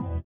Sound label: SITAR; Spectrasonics; Metamorphosis; INDIATEK